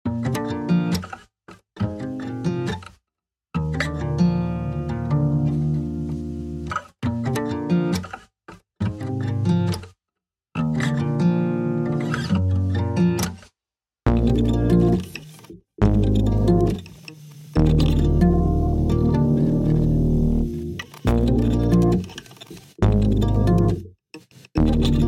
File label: 137bpm Eb major